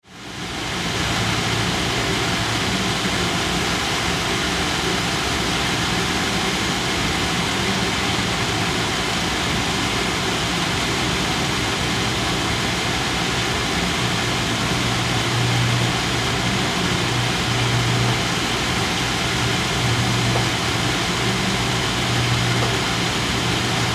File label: Water Pump, Water Pump Large Ambience; Factory; Industry; Factories; Blastwave